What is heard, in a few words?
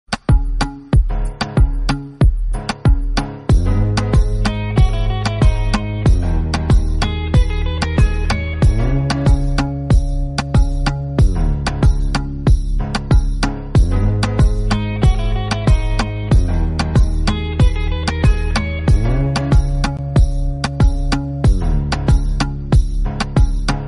slowed instrumental ringtone; english ringtones